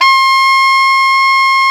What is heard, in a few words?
SAX XALTOS, Saxophones; All Saxes